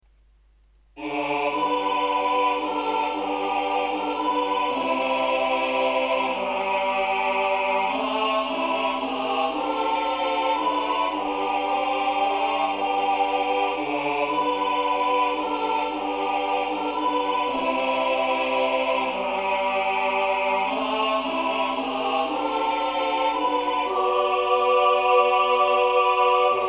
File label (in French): la plus courte chanson tutti, Chants